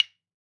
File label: Claves Coconut Palm Wood; World Percussion; Cymatics; Emperor World Percussion